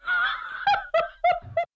hum pos

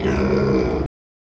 Pigs Neg

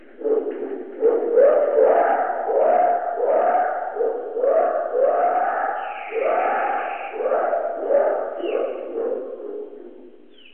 2 octaves down

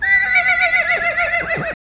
Przewalski's Neg Low

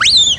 tonal scream

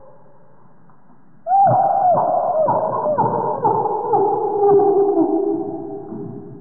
trill 3 octaves lower

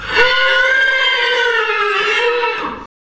Horses Neg High

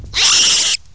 Primate Scream

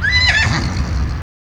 Przewalski s Pos Low

